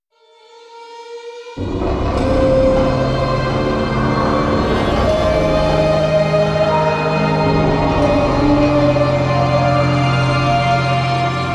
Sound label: Bonus Stinger; Zombie DLC5; Call of Duty Black Ops III